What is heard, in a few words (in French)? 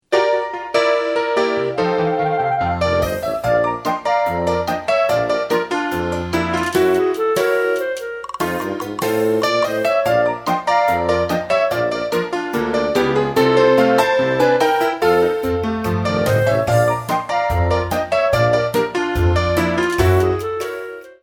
batterie fantastique